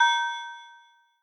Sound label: beep